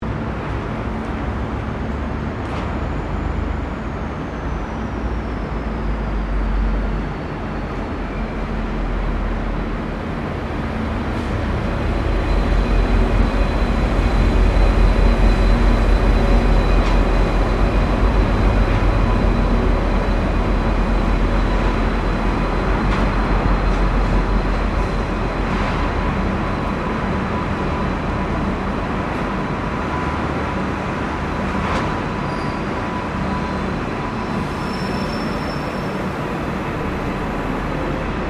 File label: AMBIENCE TRAIN STATION TRAIN MOVEMENT; Train Stations